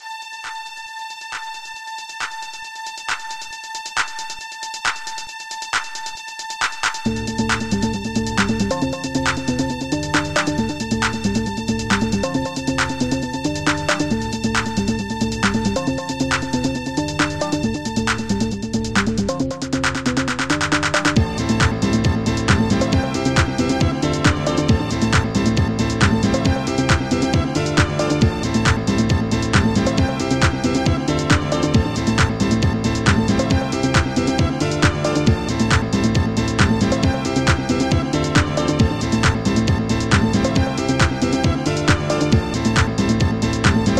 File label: PIANO